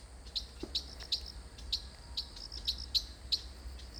Striated grassbird

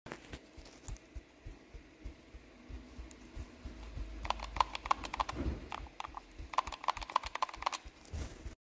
calculator; ماشین حساب

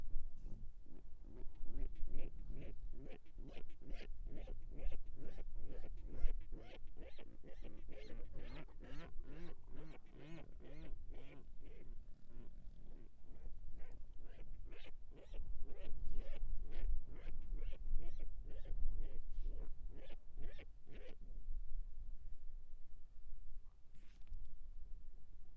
Albatrosses; پرنده آلباتروس

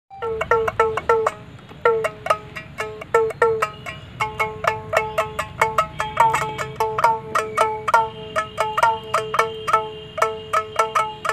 Ektara

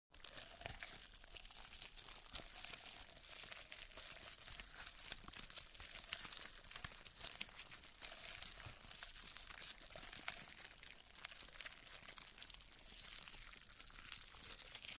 crawling

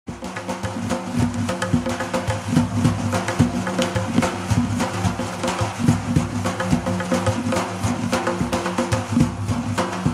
Barbat in background; موسیقی بربط در بکگراند

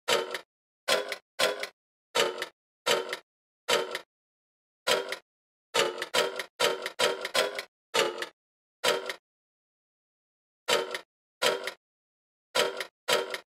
scales

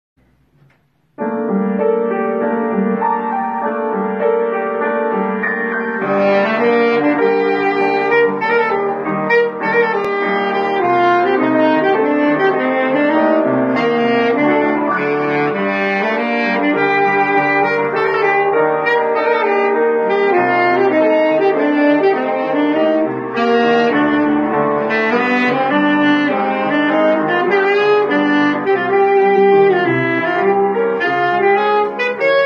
Saxophone; Majid Torkashvand

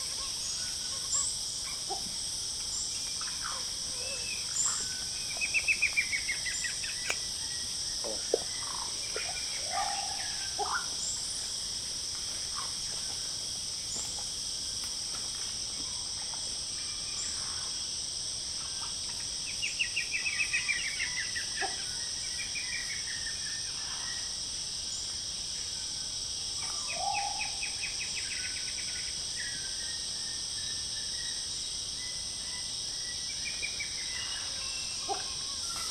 Crimson fruitcrow; کلاغ میوه خوار زرشکی

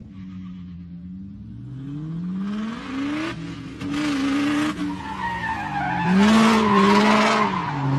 rare car drift riser

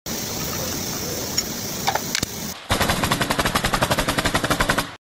nail gun; میخکوب

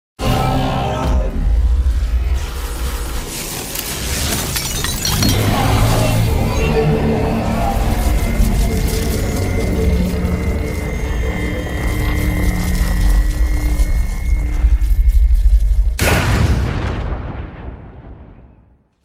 Thanos gloves; دستکش تانوس

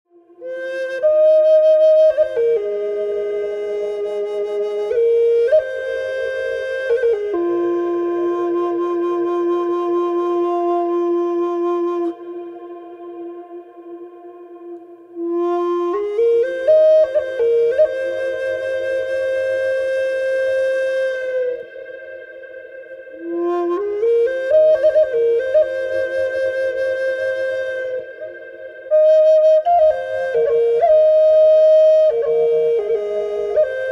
Shaolin Temple, موسیقی مدیتیشن

